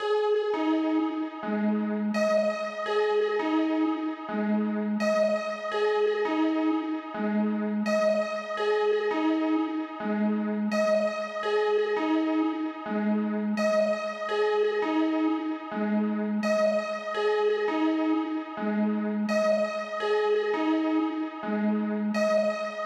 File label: 168 BPM G# Min Lead; Cymatics; Destiny Gold Expansion Stems; Balenciaga